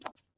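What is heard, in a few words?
concrete run right, footsteps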